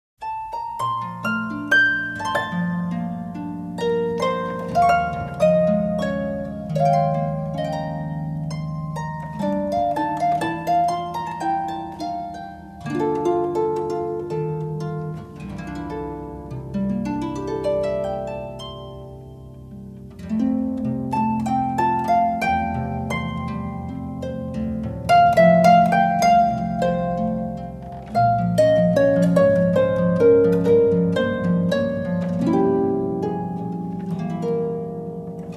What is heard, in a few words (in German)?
Harfen